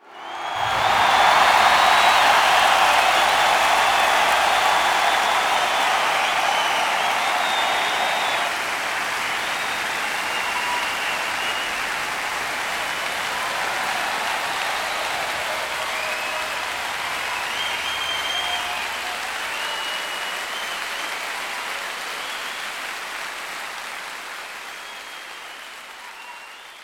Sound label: Crowd Effects